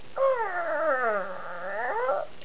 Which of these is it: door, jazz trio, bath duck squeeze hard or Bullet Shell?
door